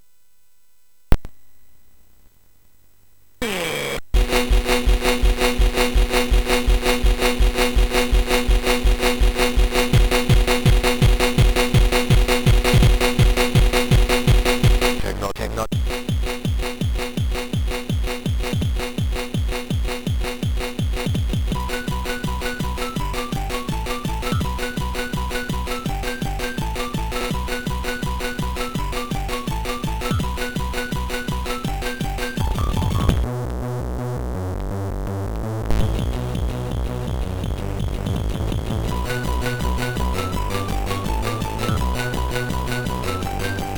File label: Techno, sid MOS6581R3